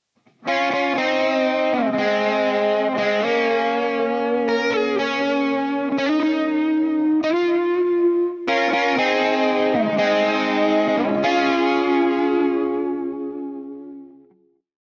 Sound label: lead guitar with chorus vibrato blend